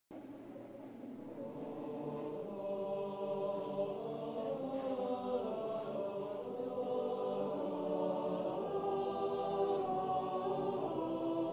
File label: The Minstrel Boy Sung; Phantom Regiment